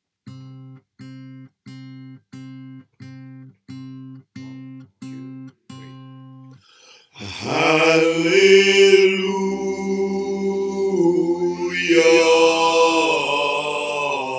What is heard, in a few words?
choir part alto, Chorale Gospel